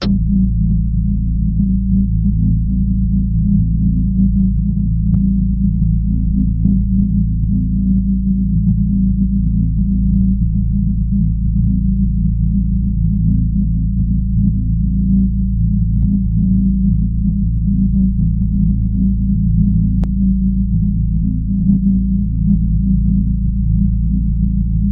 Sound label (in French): machine en fonctio